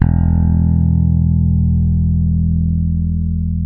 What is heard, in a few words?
rock E, rocker, bass; Spectrasonics; Bass Legends